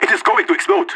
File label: bomb going to explode